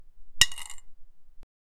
taking a wooden stick out

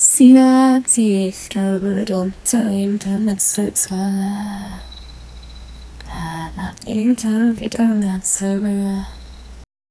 ladybug, insects

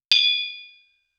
cricket ball hit, bat

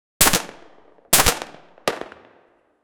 the sound of pistol twice, room

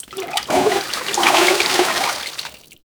splash water to the other, bucket

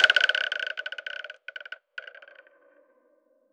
single hit dry wooden cla

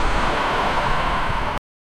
construction

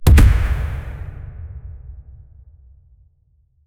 punch sound, fight

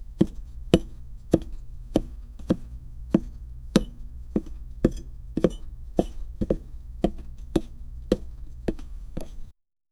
anime style the footstep

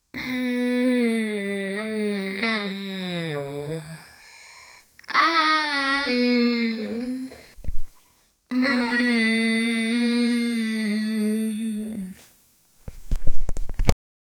sadness memory of childho, nostalgia

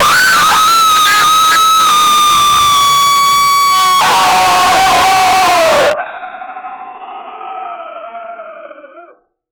loud bass boosted, bass boost

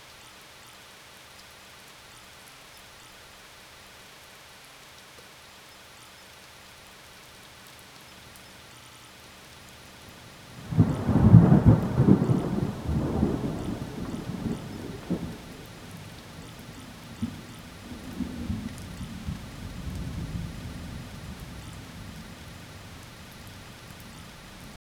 rain tropical rainy calm

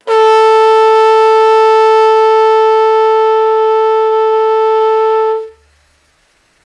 twin tone horn the higher; horns

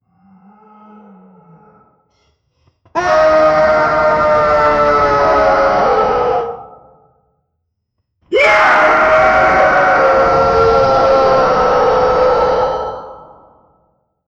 the frantic screams of a, agony

